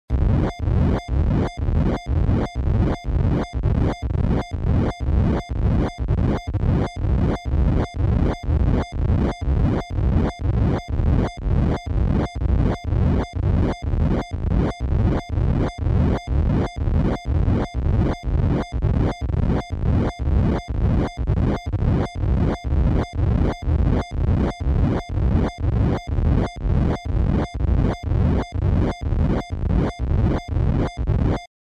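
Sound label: Pulsating grunt with beep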